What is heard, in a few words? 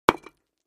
Patio Block Hit Sledg, concrete & brick